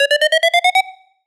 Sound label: Rising Alarm